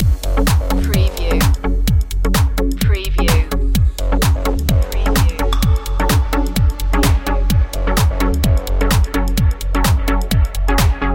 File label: fluctuate loop